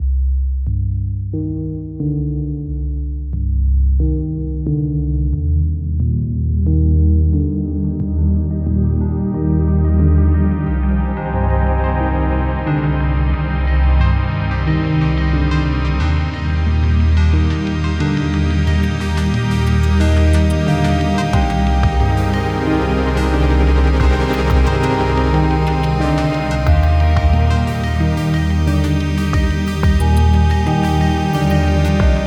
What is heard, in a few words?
Analog lab, Arturia Keylab Essential; controlador keylab essential de 61 teclas marca arturia